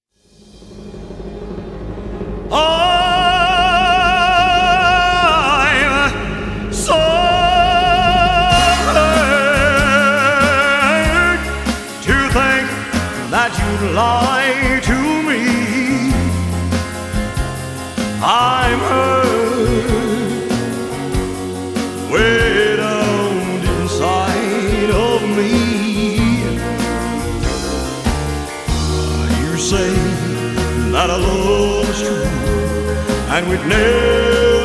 Impersonator

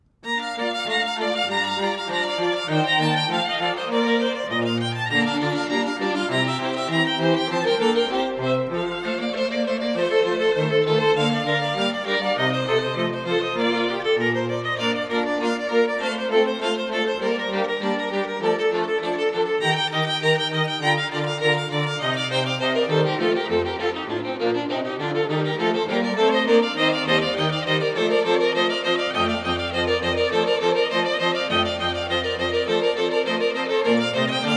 string quartet

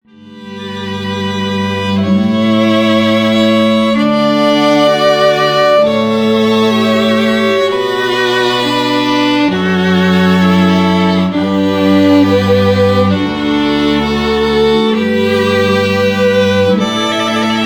Canon in D Major Classical, String Quartet

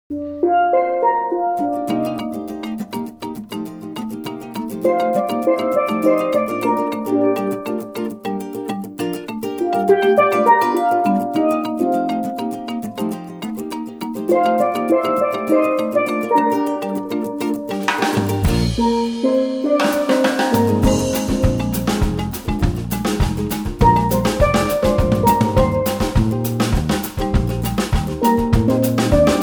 Steel Drum Band

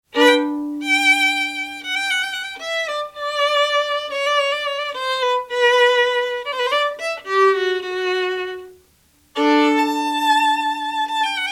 Violin Concerto No.3 in G Major; Classical

